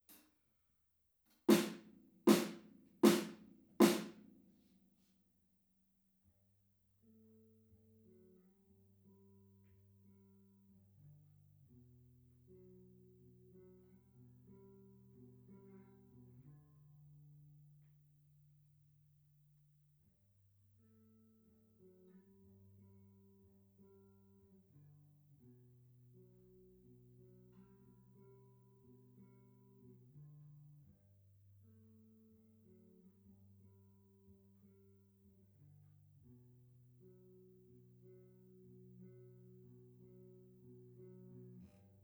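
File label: PZM Omni stereo Drums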